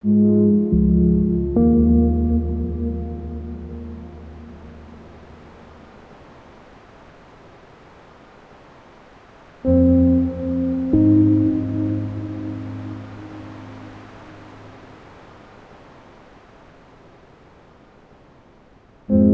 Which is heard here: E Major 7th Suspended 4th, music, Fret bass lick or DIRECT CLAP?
music